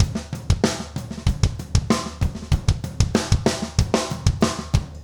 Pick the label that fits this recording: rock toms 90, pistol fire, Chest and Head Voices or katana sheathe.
rock toms 90